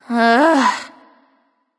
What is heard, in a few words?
sigh; smite; artemis